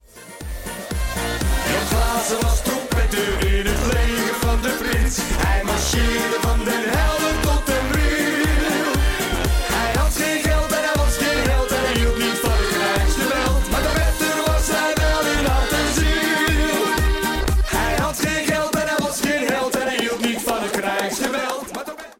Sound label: BEAT